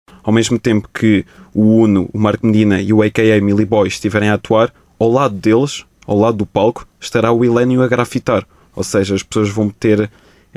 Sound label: Hip Hop